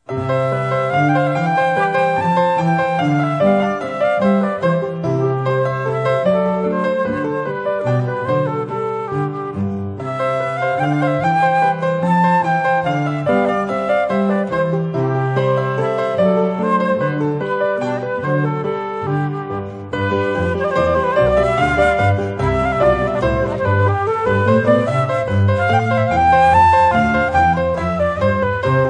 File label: Bach Flute C Major